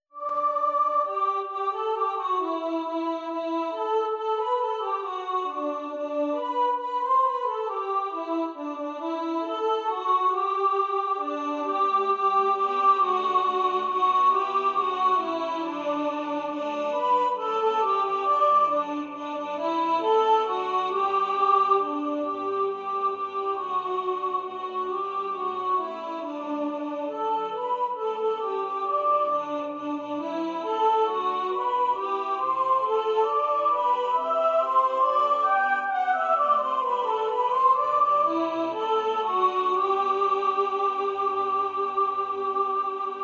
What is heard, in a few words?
Soprano; We Wish You A Merry Christmas